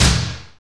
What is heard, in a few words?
tom A4; Greytsounds; ADD-ONE KITS